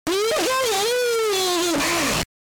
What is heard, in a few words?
Pikachu SFX; RBY